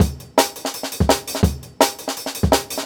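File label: Jungle Breaks, 168 bpm; Original Jungle Breaks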